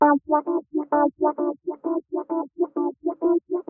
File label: Rhodes after; stereoplacer